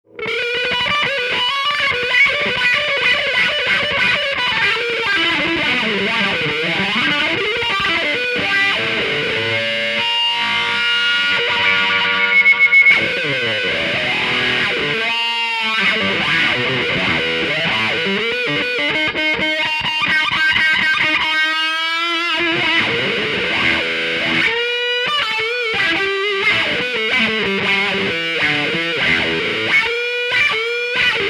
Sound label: wah